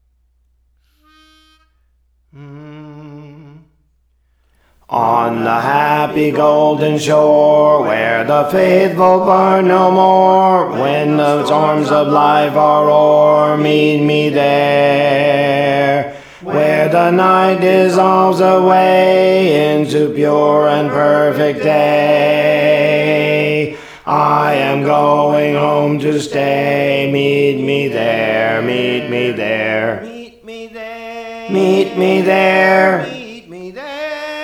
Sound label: Alto, Songs; Praise For The Lord Training